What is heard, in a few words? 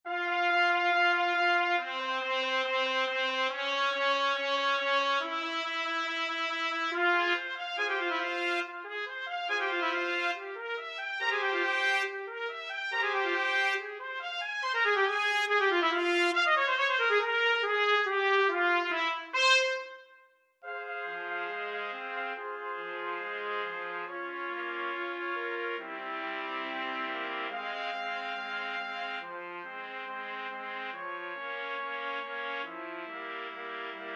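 4 trumpets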